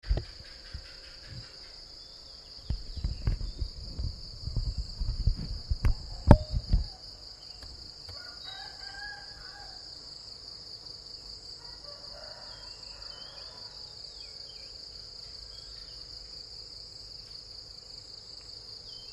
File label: Ambience morning, Vietnam; 2019